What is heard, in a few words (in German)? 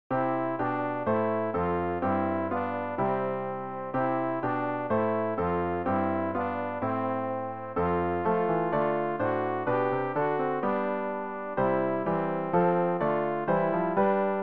alt, Übehilfen für das Erlernen von Liedern; segne und behuete